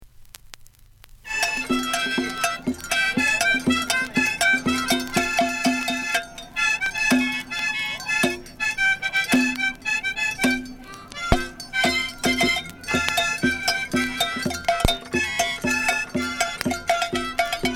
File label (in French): Homme orchestre l'Internationale